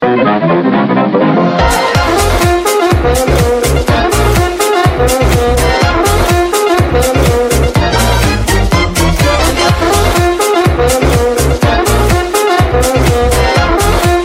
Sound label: jazzy ringtone